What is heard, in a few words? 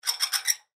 Ship Pulley System Squeak Shake; Transportation Watercraft; Boat Sound Effects; Blastwave